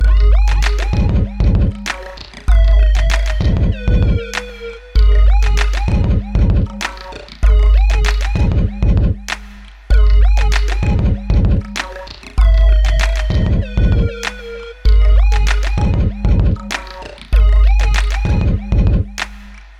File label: 97 bpm